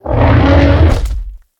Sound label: Mammoth sound